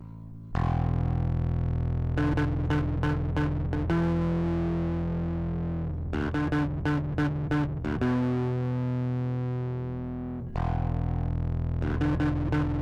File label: 1N60 Center, Over Drive; シンプルなOver Driveの製作